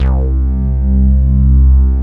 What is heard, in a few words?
BASS C2; Q Up Arts; Dream Experience